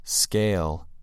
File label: scale